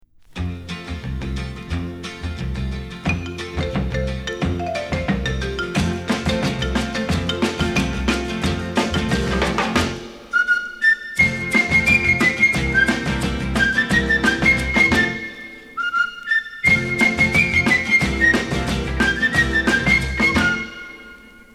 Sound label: guitar orchestra caliente